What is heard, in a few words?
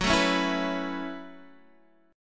F sharp Minor 11th, Guitar, Open D